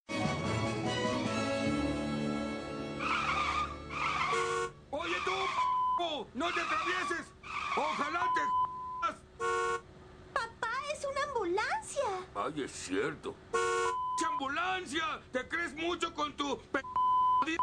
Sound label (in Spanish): Ambulancia; Homero